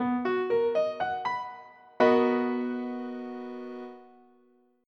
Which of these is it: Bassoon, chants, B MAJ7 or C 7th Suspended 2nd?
B MAJ7